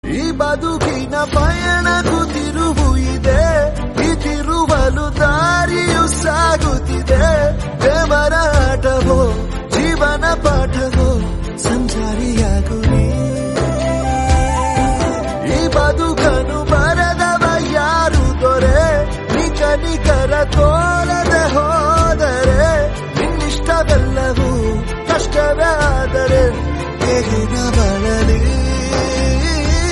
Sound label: tamil song; love mocktail 2